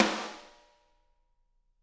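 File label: snare drum; Bob Clearmountain Drums I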